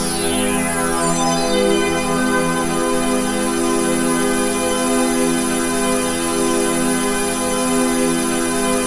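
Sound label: chord pad, Pads; Best Service; XX Large - Pads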